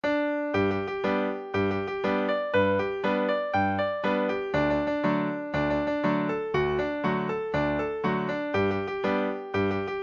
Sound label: hornpipe, session tune midi; trumpet